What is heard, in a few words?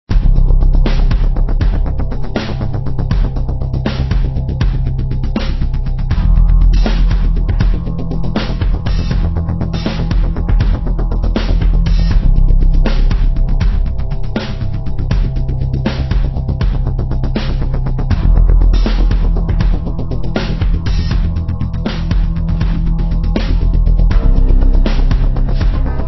Music Loop; gamerip; supermechs